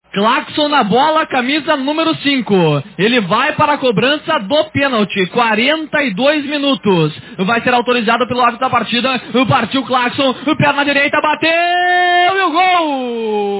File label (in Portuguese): clacson